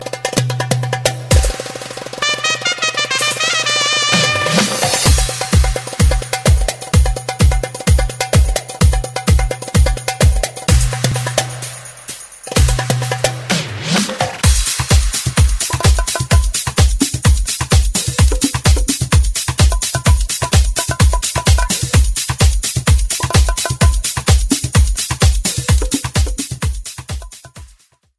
Darbuka Power

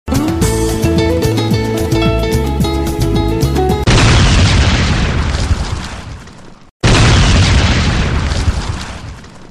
Sudden Bomb

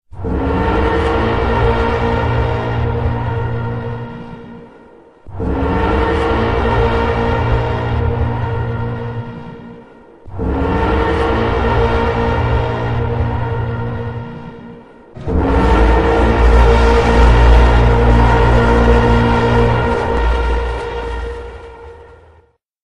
horn

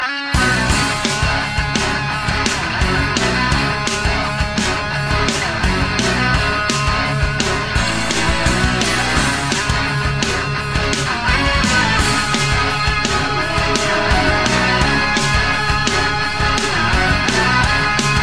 Rock Mix; Ssbb

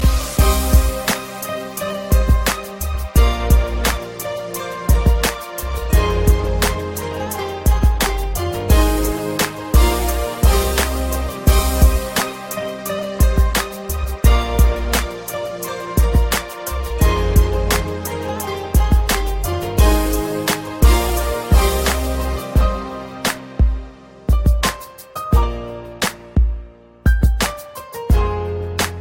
Love Sad

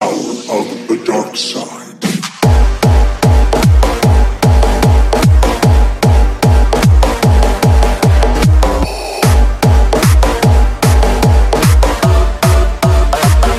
Bass

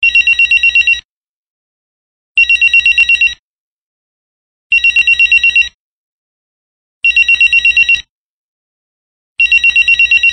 Super Ringer Tones

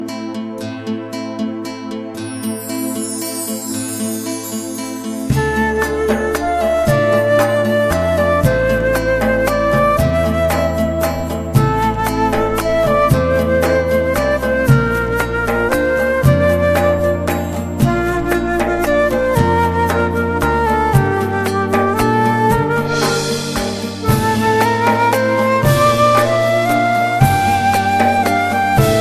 New Beautiful Music

